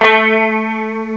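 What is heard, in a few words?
guitar high; CMI Sound Library Rev 1.3; GUITARS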